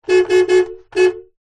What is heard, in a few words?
vehicles car hyundai trajet horn beep x4 internal; 2006